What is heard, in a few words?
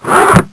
Clothes Drawer Shutting; Soundsation